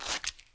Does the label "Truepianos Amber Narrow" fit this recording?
No